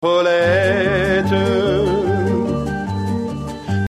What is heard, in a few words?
Arpege